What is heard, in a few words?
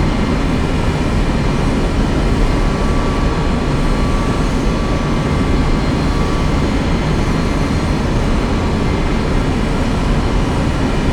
Aircrafts Engines Su27 In Engine L; DCS